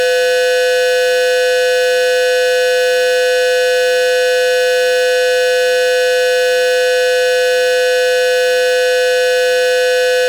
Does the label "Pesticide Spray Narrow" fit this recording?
No